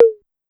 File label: Tom 808 Down Slow, Pitch Env; 300 Drum Machines; Pitch Env Various